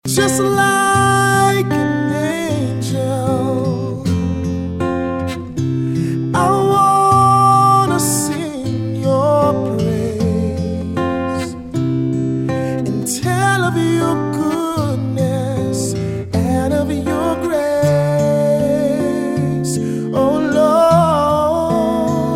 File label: ring tone